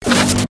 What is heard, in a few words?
medi shield burn, weapons; tf